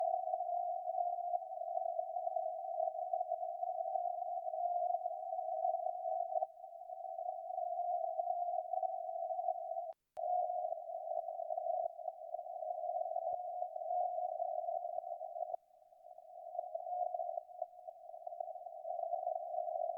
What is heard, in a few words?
14.9khz